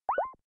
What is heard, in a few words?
Mic Mute